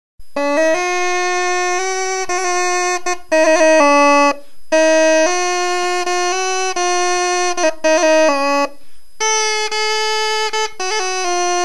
Crocorigofono

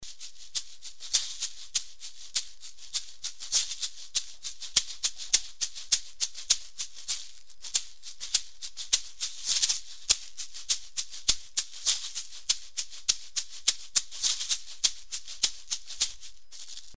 Shakers Ricoperti, nuovi strumenti; URM Sonos de Sardigna; Il laboratorio di strumenti sardi di Raimondo Usai

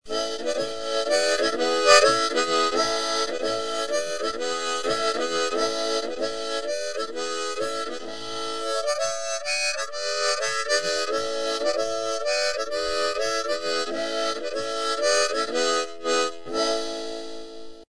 Sonette En Corru; Sonetto de corru; Le rielaborazioni; Seneghe OR; URM Sonos de Sardigna

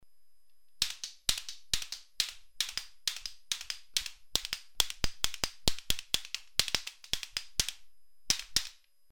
Canna Isperrada, strumenti musicali antichi; URM Sonos de Sardigna; Il laboratorio di strumenti sardi di Raimondo Usai

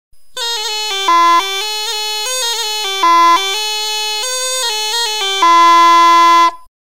Bena cun corru, strumenti musicali antichi; Gli strumenti antichi; Seneghe OR; URM Sonos de Sardigna

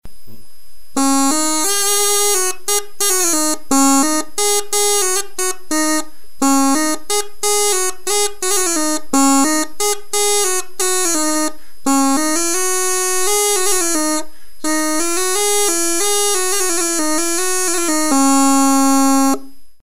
Crocorigofono

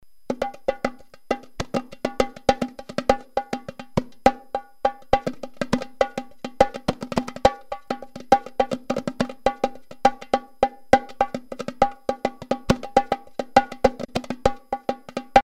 Tamburu Carradeddu

